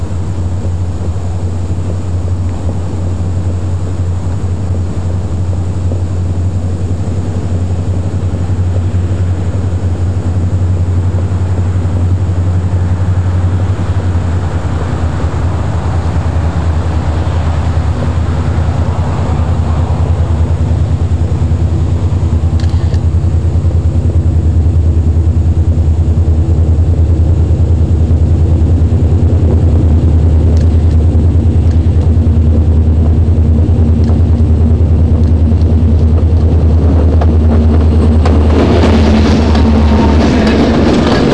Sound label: freight train